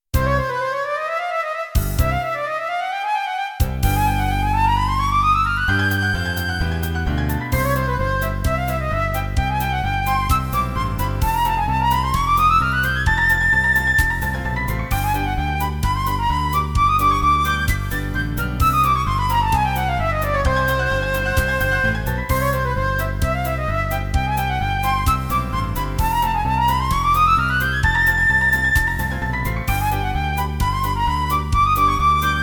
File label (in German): Musik der Fliegenflug; Cornelia Funke erzählt von Bücherfressern